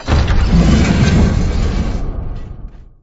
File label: Door Metal Giant Open